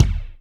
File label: Kik Flanged, Alesis D4, Drum Machines